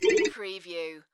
Pinball sound, tone

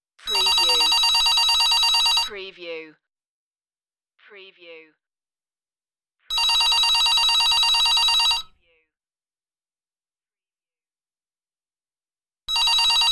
Telephone Digital 3 Rings

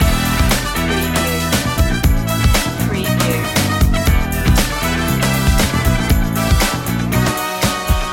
the funky one loop

